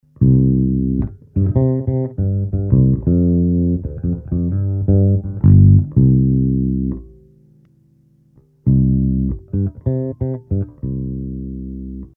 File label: Chorus FX